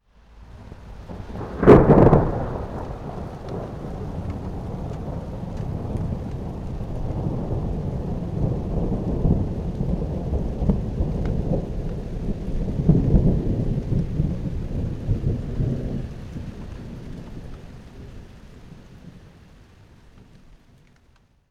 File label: Thunder; UltimateFishing